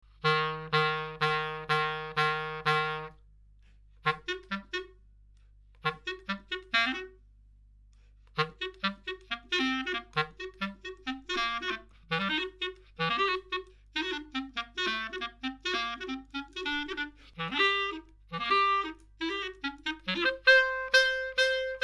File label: harlequin, clarinet